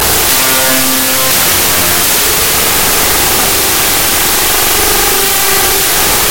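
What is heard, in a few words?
Message Tone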